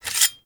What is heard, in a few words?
Dagger Equip; Warframe